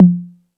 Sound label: Beat Box, E-MU Pro-Cussion